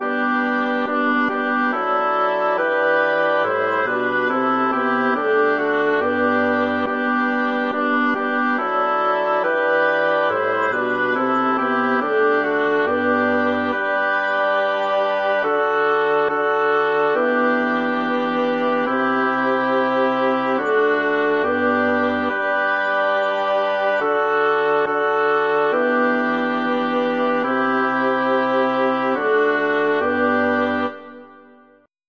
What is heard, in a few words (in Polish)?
wieloglos psalm